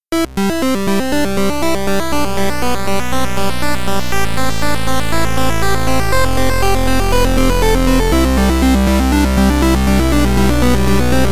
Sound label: ARP SQU